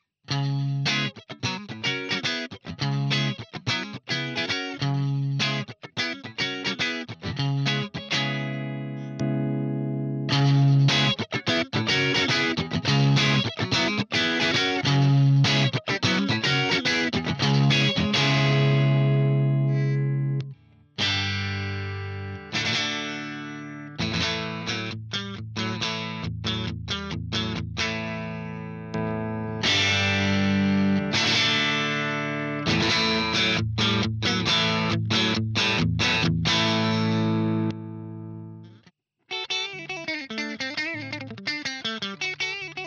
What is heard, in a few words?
JCM2000 TSL clean channel Telecaster